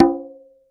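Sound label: Quinto open, EMU Orbit-3 drum machine; 300 Drum Machines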